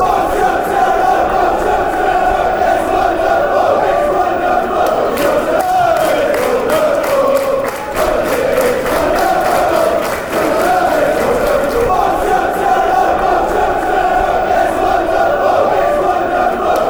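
cheltenham wonderful, football songs and soccer chants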